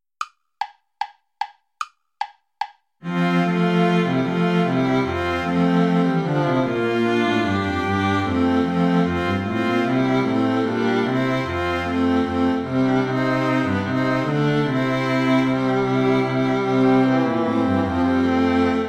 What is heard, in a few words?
bourree play along without violin; praetorius michael iii